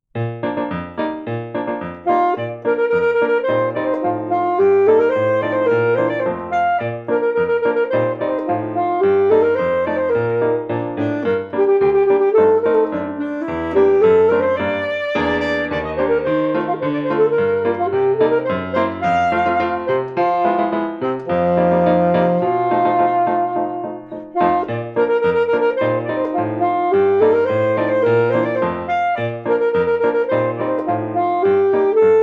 scherzo; fox

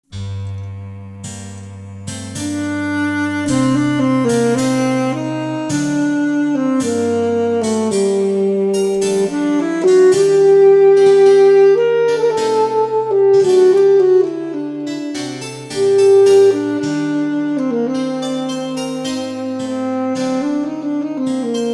Sonata Adagio; Eccles

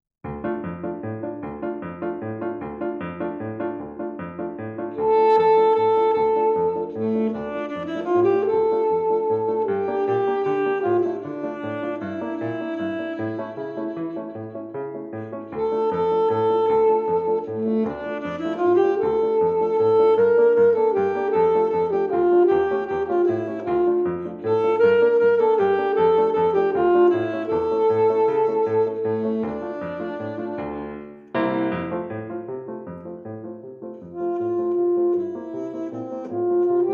fandango

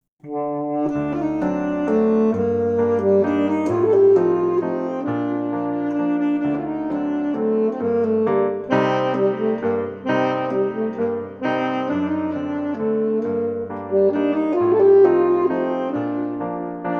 Mazurka; Chopin

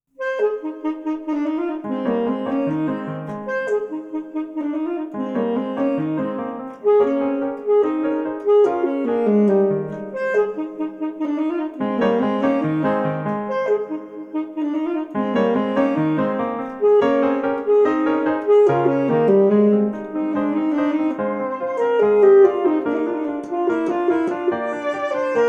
Presto; Clementi

